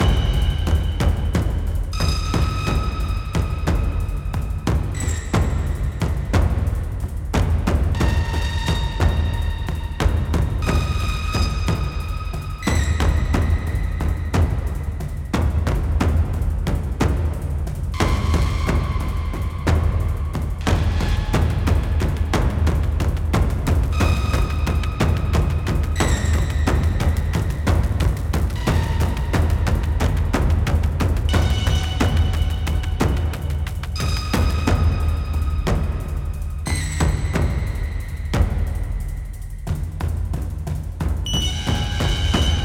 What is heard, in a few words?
Action, Loop, 180BPM, Classic Horror Sci-Fi Music Pack; Dead Ark